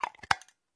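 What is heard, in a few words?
ui button colour confirm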